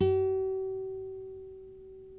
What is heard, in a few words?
NYLON NO VIB G#4A, Guitars; Spectrasonics; Hans Zimmer Guitars VOL-1